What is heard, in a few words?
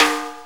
Snare B; Sonic Foundry (Sony Creative Software); Drum Tools